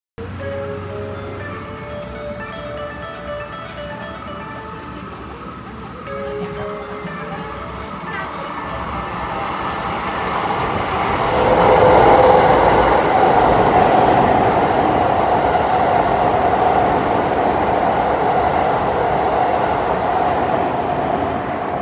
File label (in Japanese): 通過, train sounds; 大阪環状線